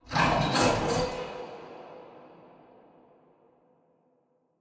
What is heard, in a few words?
door jiggle